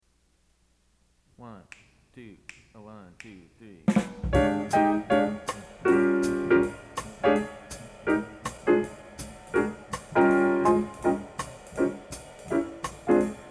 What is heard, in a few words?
double bass b flat blues; Blues will be performed in F; Jazz Studies Auditions